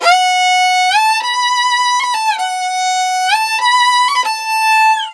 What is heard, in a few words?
VIOLIN, Balkanish Traditions